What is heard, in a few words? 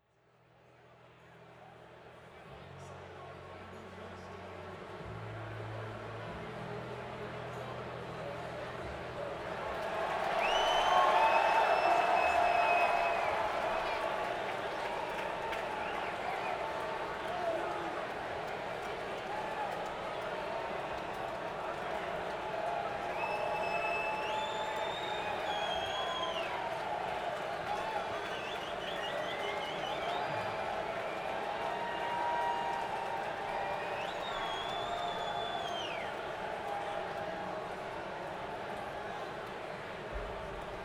Crowd, Saratoga Performing Arts Center; Saratoga Springs, NY